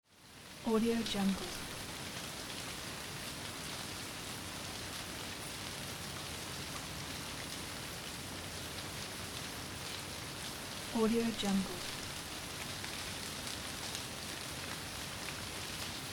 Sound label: light rain drizzle